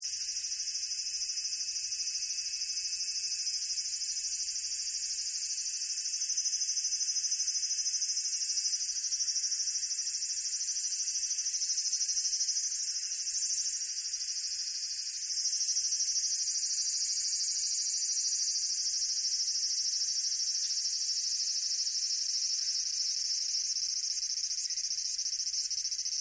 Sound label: Insect Cicadas Tokyo Summer, Japanese insects; Animals